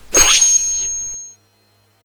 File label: instant transmission